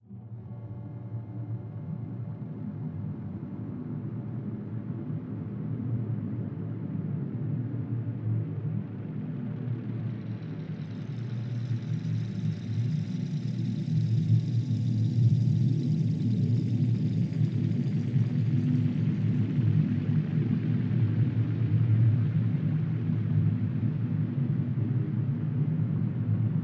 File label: space ambient; Stellaris